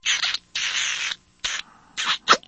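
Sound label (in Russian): поцелуй продолжительный взасос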